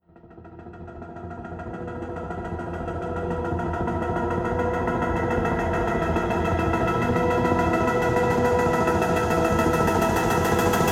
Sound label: industrial rattle, risers; whooshes rises